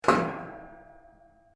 METAL ON METAL; Metal (Sound Effects:Materials)

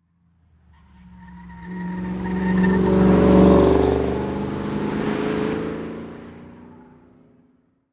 Race Car Pass By Tire Screech; Power Car sounds; Car Sound Effects

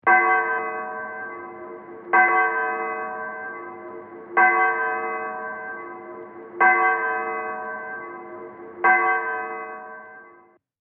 Church Bells Vintage; Bell Sound Effects; Musical

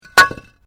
Brick Medium Hollow Fall; Material components for constructing more complex sounds

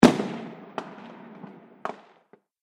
Fireworks Big Bang Little Bangers; Firework Sound Effects; Celebration, Party, Holidays; Andrew Fleming

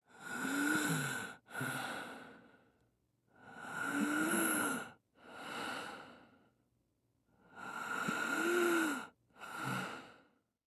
Human Male Breathing Wheezing

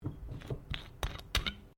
Bottle Glass Cap Metal Screw Off; Various bottles; Materials

